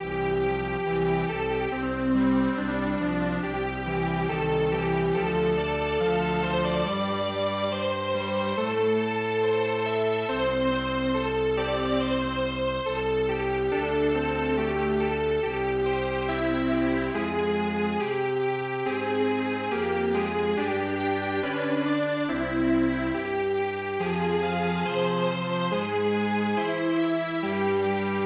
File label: Strings Quartet